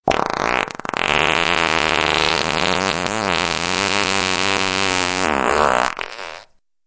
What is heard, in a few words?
longest fart; fart alert